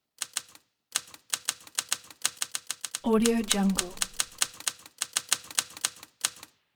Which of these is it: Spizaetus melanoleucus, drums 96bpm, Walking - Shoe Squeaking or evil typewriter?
evil typewriter